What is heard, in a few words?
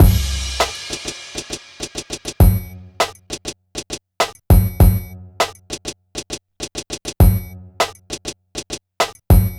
Hip Hop Beat, Drums